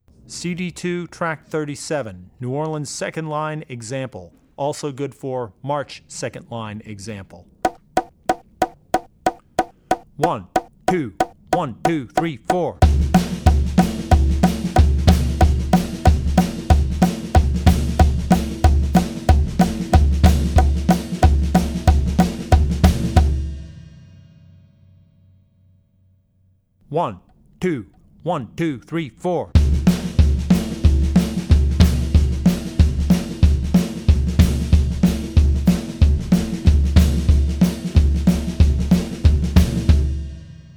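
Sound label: Jazz - New Orleans Second Line, Drum Tracks; Drummer's Bible Tracks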